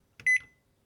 Beep Unlock

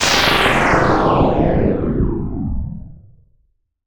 Alien Wierdness Descending, Sci Fi; 1001 Sound Effects

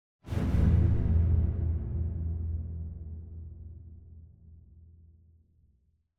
Symphonic Hit Bass Strings Hit - Double; Hollywood Edge; sound designer's toolkit v2

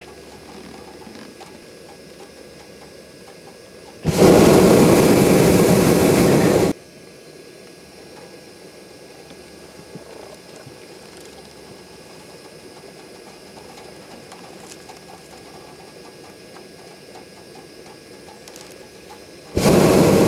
captive balloon, air; best service Blue Box

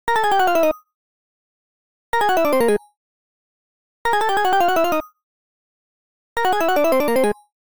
Arcade Click Negative; boom library; The Interface

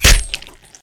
Bayonnet, Stab Into Flesh And Turn; gun and weapon sounds; captain audio; fully loaded

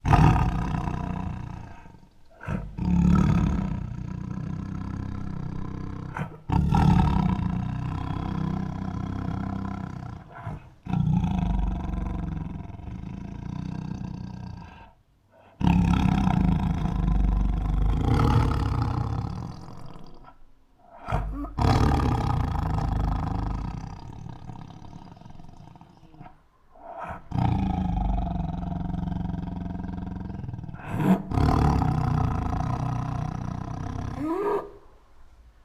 Many Protective Growls, Tigers; Sound Ideas; Tigers Sound Effects

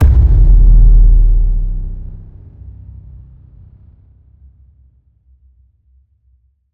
HIT SciFi Low, Cinematic Hits Designed

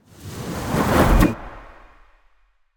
Ice Spell Freezing Slowing Chilled Arrows; Arctic Aura

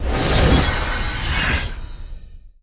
Pickup Magic; SFX Kit; Pickups

